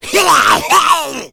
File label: smoker spot prey; voice, idle